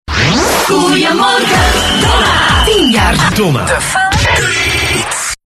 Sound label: jingle; 10jaar